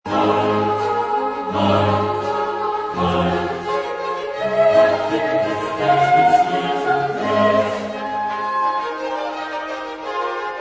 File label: Cantata, chorus; Bach; BWV67; Halt im Gedachtnis Jesum Christ